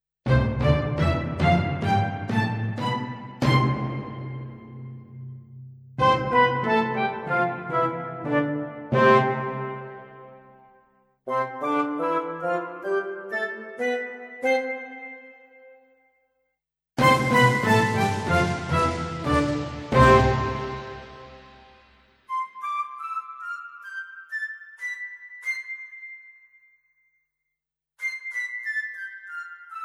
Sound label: Scales (Music); Creative Capers